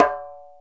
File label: Djembe Te; EMU Planet-Earth